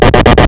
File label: fire; stackmat